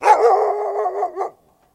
Dog Bark Husky Bark Pa, Dog Bark With Reaction From Another Dog Exterior Dog